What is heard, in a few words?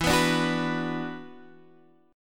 E 7th Suspended 2nd E down Guitar Half Step Down